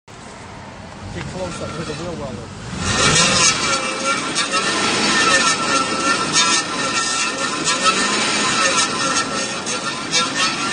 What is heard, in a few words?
noise, dodge ram 1500 4.7; 3rd gen ram